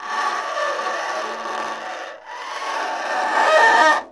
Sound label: Scrape Metal On Metal